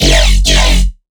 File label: Riddim Bass Hit F#; One-Shots; Bass Hits; Slippery; Ghosthack; Riddim Essentials Volume 2